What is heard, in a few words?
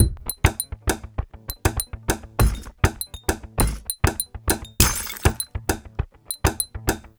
Pop Found Perc Combo; Pop Rock; Pro Tools Essential Demos; Digidesign; Essential Loop Demos; Demo Session - Pop Rock